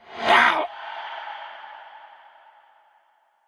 Wound; King Terenas

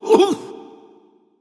Roar Wound

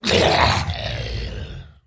Wound Crit; General Vezax

